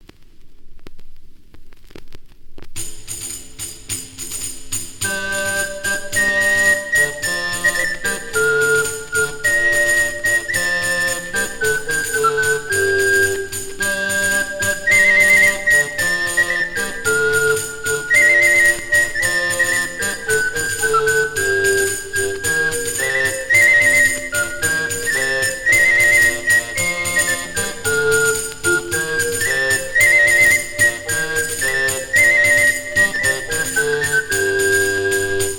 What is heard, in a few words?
Tudor Music; Accompanying Music; Sherfield